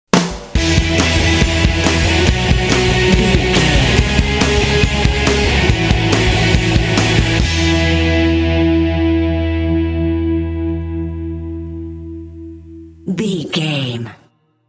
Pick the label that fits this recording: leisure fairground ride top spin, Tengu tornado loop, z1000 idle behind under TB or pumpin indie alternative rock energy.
pumpin indie alternative rock energy